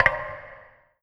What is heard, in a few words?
gem create; ui